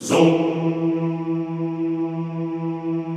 ZOOMMM E2, Classical Choir; Best Service; Extended Classical Choir

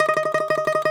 DOMRA D, Prima Domra, Plucked Strings; E-MU Producer Series Vol. 7 – Old World Instruments

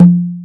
Big Conga, Drum Machines; EMU Planet-Phatt

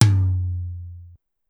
Tight Tom Lo, Pitched Up; Clavia DDrum-AT

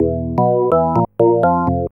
ORGN LICK, K E Y S

